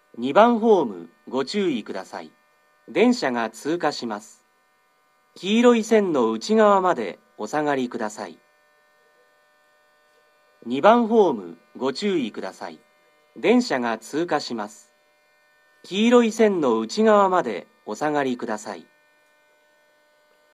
oizumi-gakuen pass, seibu station

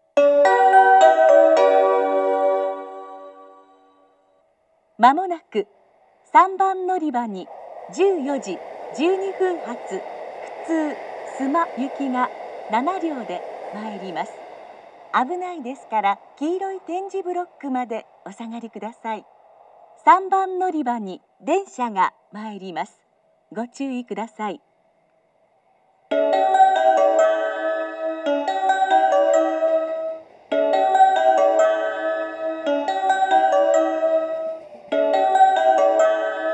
nada local suma; station

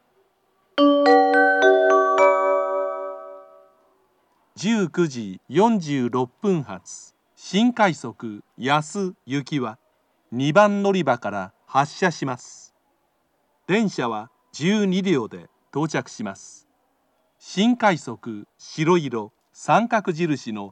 special rapid service, kyoto, station; yasu

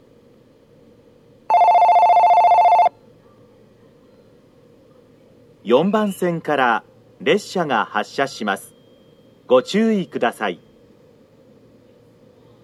biwajima, station; jr central

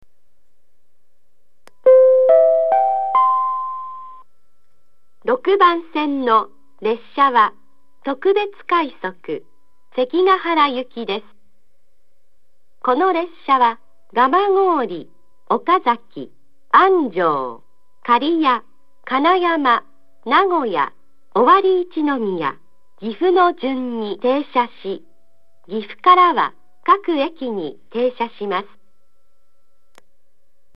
toyohashi special rapid sekigahara; station; jr-central

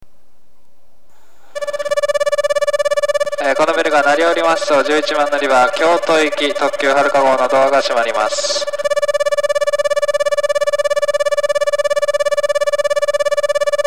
limited express, station; jr-west; shin-osaka; haruka32; kyoto

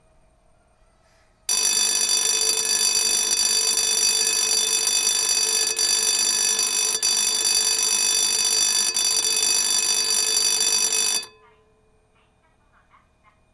gakunanharada, station

